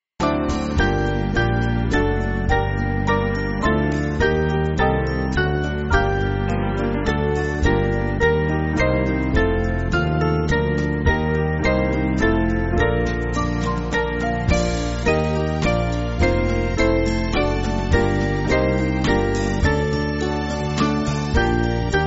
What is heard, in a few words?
Band; Delay Not; Maldwyn